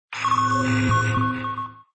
scan

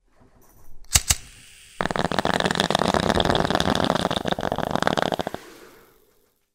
bong

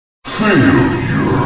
failure; reversevape

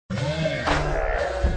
HOO UGH

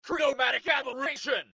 chromatic

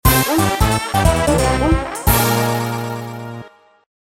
draw music

